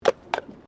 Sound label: Tram Horse Drawn Horse Step Pavement